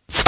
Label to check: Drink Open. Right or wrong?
Right